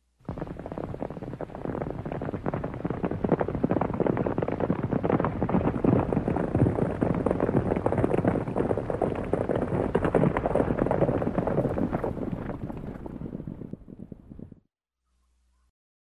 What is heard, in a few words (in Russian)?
tabun koney